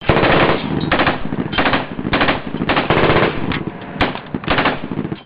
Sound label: M16 Assault Rifle